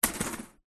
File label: household air fryer oven metal grill tray set down; zapsplat